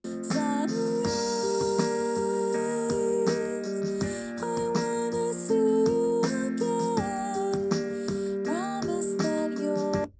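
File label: with drums mix; SiSEC10; underdetermined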